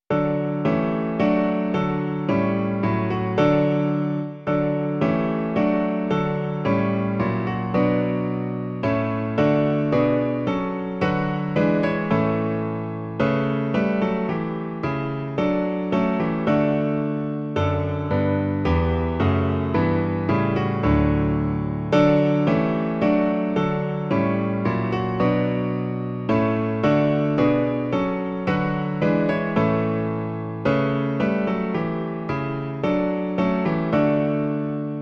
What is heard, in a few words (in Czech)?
klavír; Klavírní verze